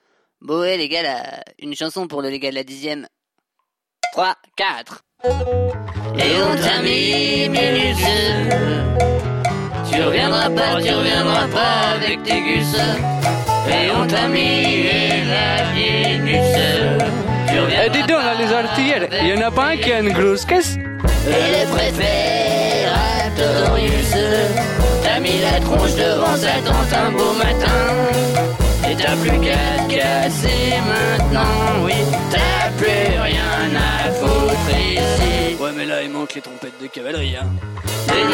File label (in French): chanson